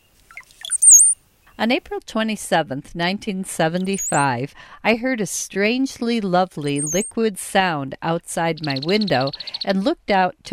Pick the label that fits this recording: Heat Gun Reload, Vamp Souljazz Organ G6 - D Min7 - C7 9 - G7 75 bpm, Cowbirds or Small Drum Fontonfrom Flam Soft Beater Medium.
Cowbirds